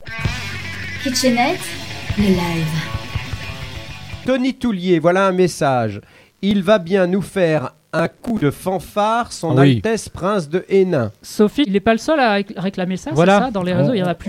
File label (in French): Fanfare live; Prince-de-Haynin